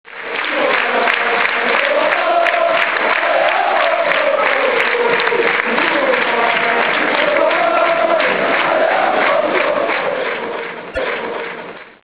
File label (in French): Girondins de Bordeaux allez ho ho